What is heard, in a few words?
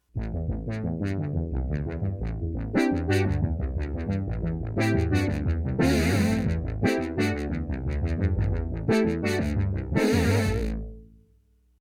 French Horn, prophet 08; sequential